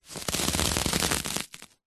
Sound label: office packaging bubble wrap roll rub finger run through layers